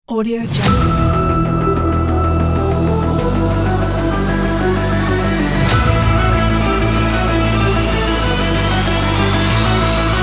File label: action sport music